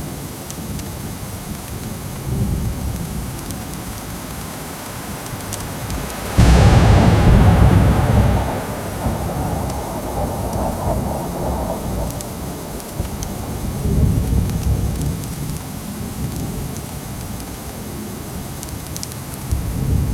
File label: dramatic scottis, highland